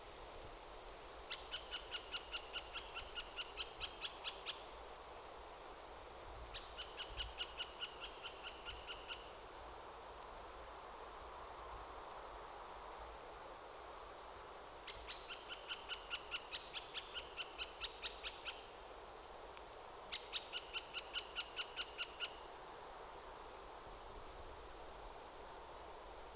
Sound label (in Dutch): Middelste Bonte Specht Dendrocoptes medius